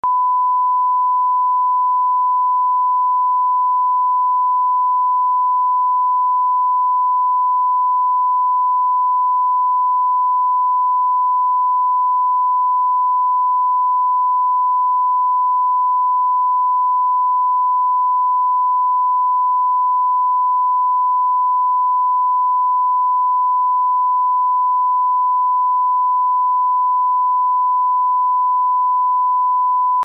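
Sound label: 1000 Hz audio frequency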